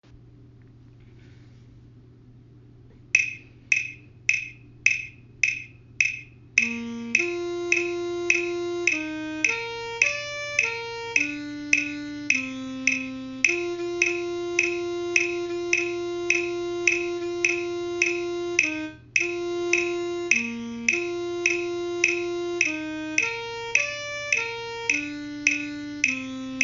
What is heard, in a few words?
alto sax